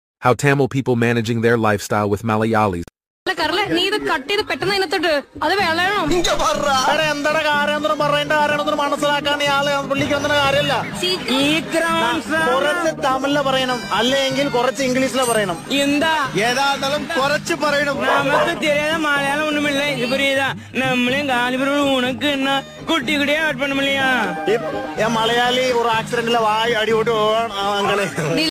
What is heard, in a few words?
love rap song, malayalam; joe movie